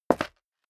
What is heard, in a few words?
step leather shoes concrete grit single right; schritte